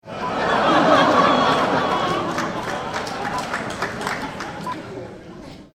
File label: Laughter